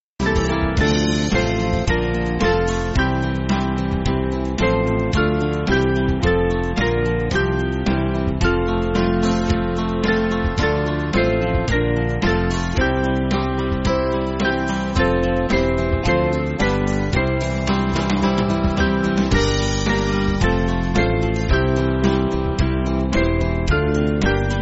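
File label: Music Backing Files for Churches; German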